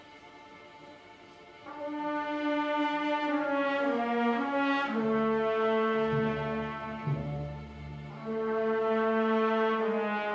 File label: Suite Ouverture Carmen concert; Fougeres